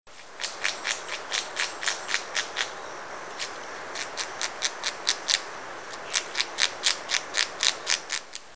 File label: agouti